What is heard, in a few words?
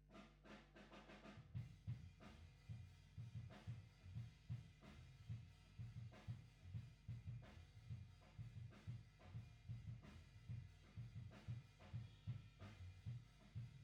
GTR B